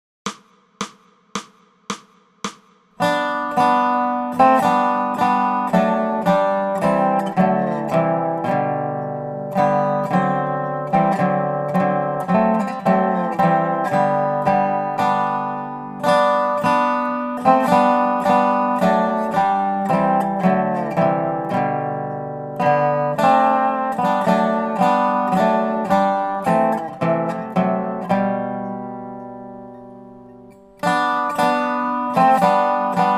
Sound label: Dobro; christmas; Away in a Manger